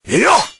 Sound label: knight attack start